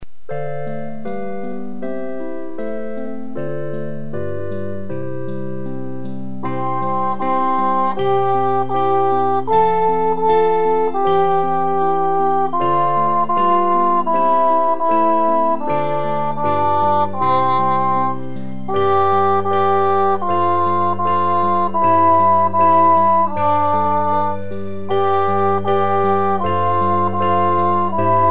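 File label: Twinkle Twinkle D (C concert)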